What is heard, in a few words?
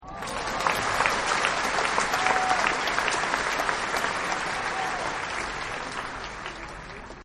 Applause Small Crowd; Human; Applause Oohhhh